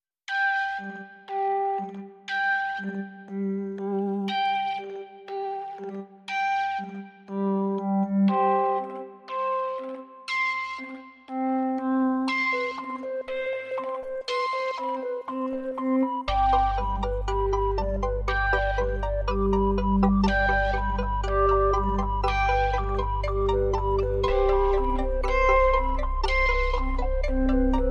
Quirky Magic, Light

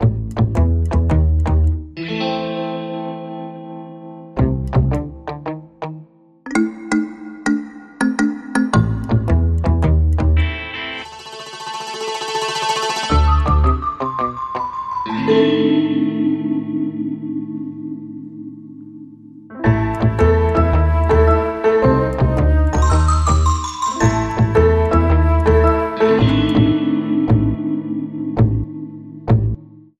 No Perc

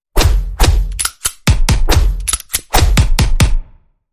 Combat Unit Engage Pulse

